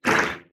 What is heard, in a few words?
wriggler attack, enemies; Factorio